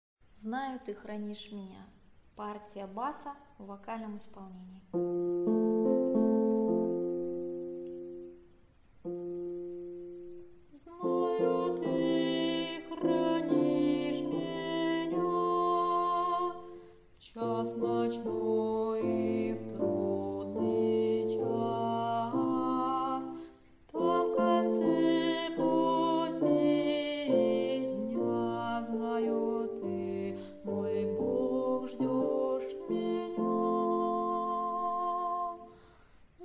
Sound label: bas vok